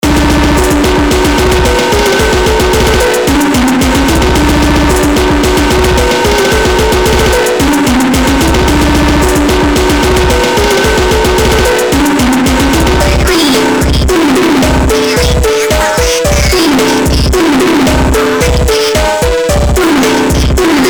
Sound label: gore phonk